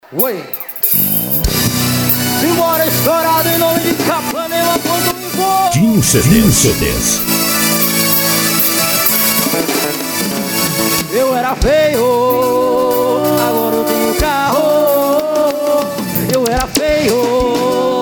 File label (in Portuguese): concurso calouro cantor forro estourado